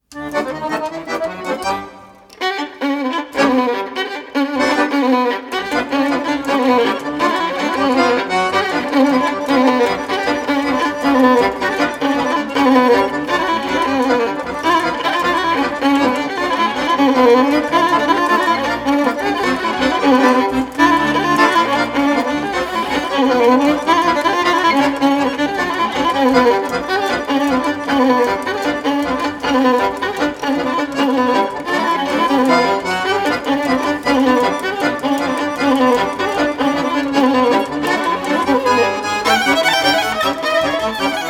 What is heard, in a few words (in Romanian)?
Briul de la sol major